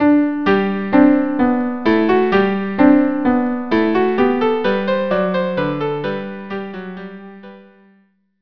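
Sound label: marche viola, viola; bach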